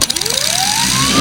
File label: minigun wind up; weapons